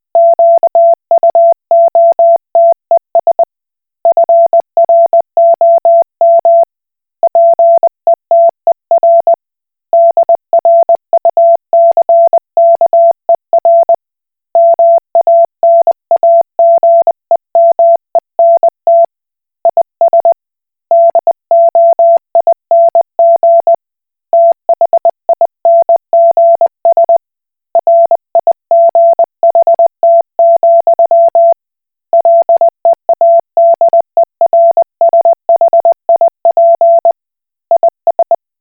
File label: Koch Morse